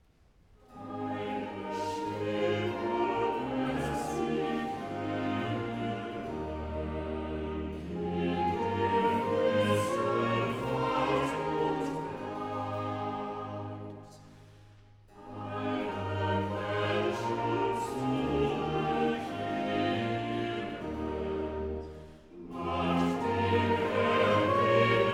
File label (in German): Choral; BWV 30 'Freue dich, erlöste Schar'; 'Eine Stimme läßt sich hören'; Bach; London; Feast of St John